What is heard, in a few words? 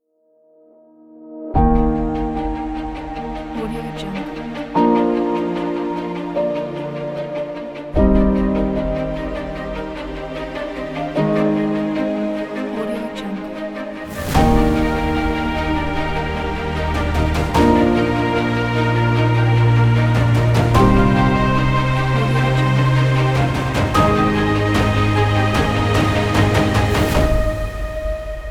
the cinematic trailer